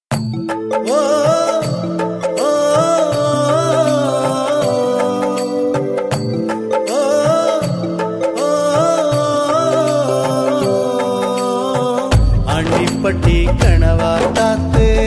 Tamil Song

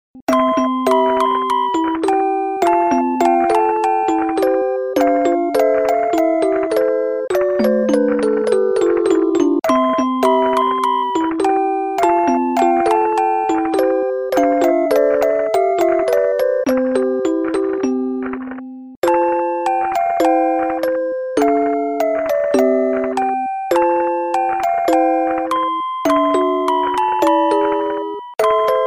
Musicbox Nostalgia

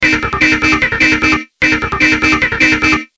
Beep Horn Sms

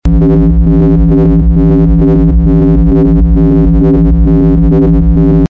Fluid Engine

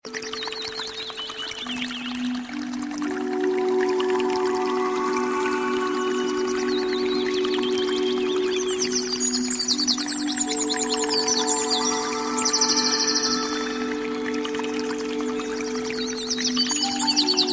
MORNING Alarm

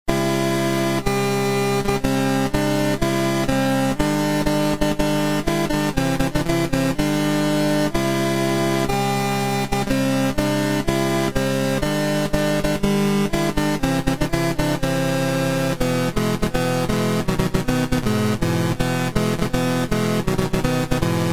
Summer Games C-64

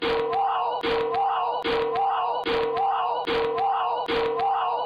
Thunk

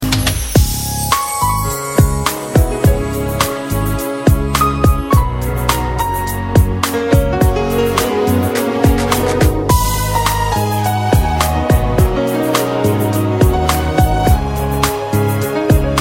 instrument

